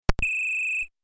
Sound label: WHISTLE